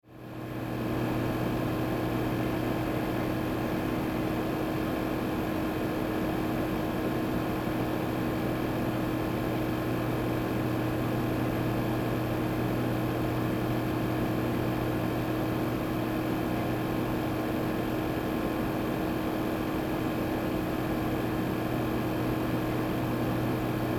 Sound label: Computer Run Fan Desktop; Technology; Electronics